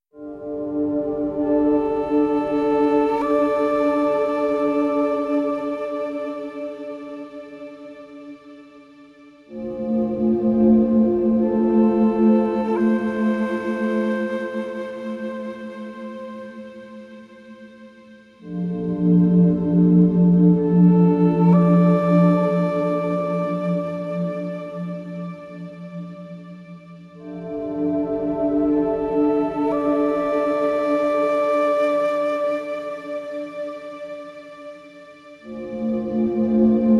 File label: Flute; گلچین های بی کلام فلوت آرامش بخش